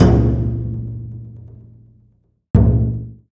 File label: Akai MPC2500 Wadaiko